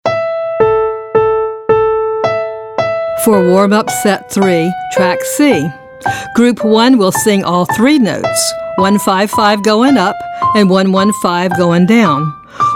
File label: Warmup Count Hold